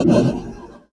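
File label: Mech Fidget Vox; Sid Meier's Civilization 4 Complete; Beyond the Sword; Units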